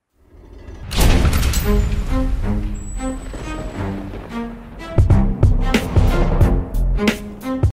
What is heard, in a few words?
dumb instrumental dope old school rap hip hop beat